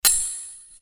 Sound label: Sword Sound; FXHome; Fighting Sounds